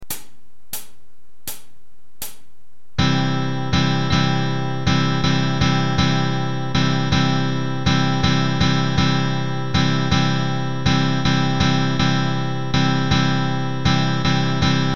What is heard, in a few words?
Strumming Exercise, guitar